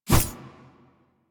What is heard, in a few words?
Melee Block Start; Warframe; Weapons; Whooshes